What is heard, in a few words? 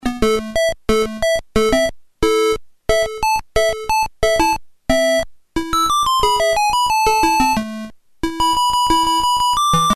MSX; Triton